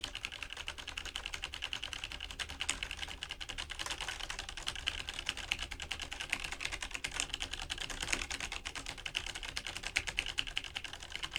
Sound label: K658 keyboard